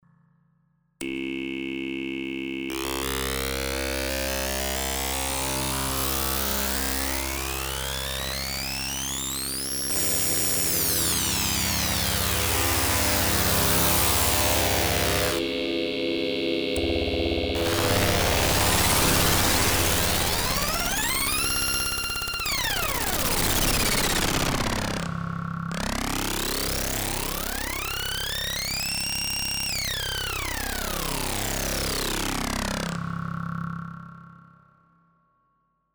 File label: Loopstart